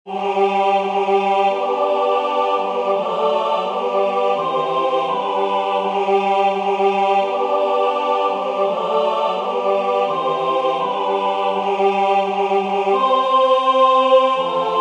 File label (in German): Chor; W-O VI. Weihnachtsjubel Nr. 3